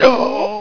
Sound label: DYING; BattleSNDS